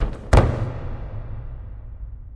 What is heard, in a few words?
victory bodyfall; Smite; Cabrakan